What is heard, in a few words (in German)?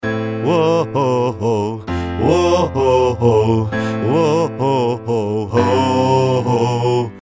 insert dopplung tune delay; Verdopple die aktuelle Stimme